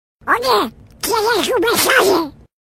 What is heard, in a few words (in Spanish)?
Tono De Pato; Donald